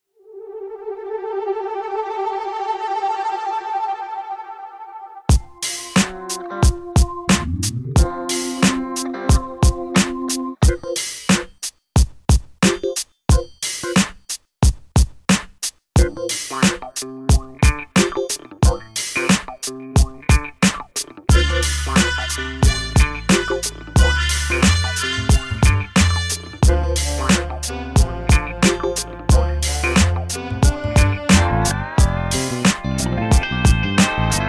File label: Fat Overdone Beat; JoosTVD; For Your Pleasure Vol1